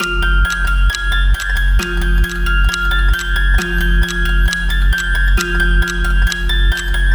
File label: Ill Omened Clock Urgent, horror music box; HauntedBloodlines